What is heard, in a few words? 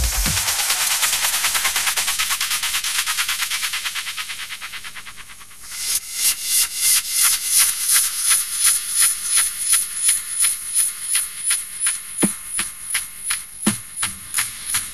ringtone hiphop